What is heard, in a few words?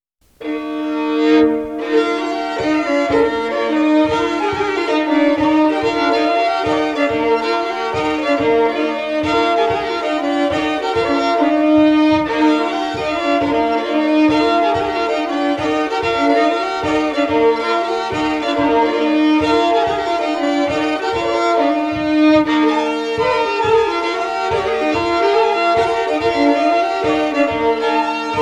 Rorospols